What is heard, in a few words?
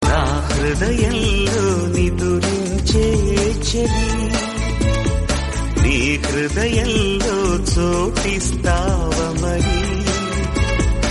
telugu ringtone